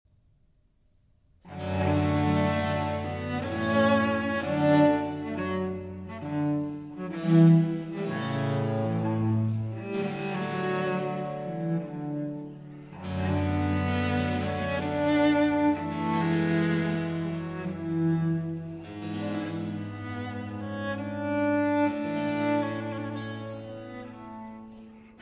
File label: Sonata For Cello and Continuo in B flat; Vivaldi; RV.47